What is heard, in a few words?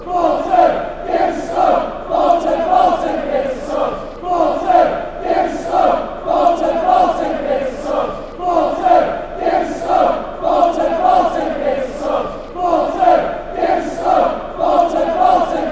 give us song, chants; bolton